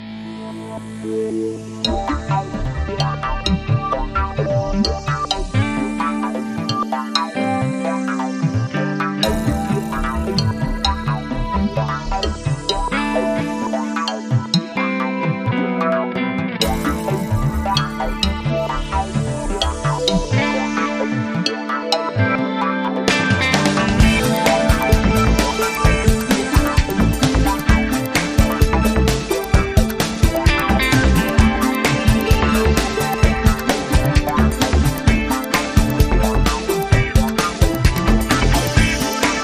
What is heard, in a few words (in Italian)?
Misteriose Vibrazioni